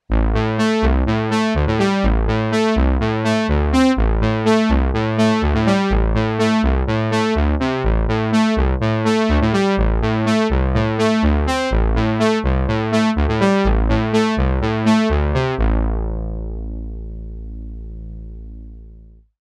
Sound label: Brass